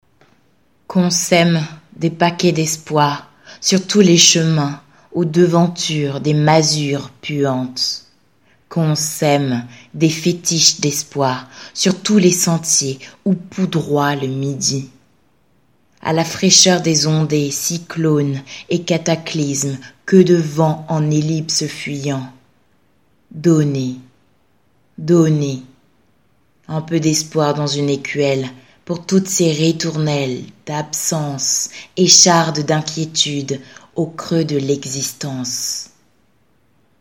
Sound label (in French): Violon